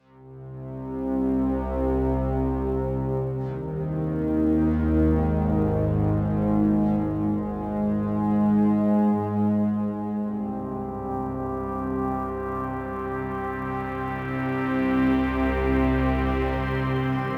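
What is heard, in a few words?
Day Ambience Stinger; dying light gamerip 2015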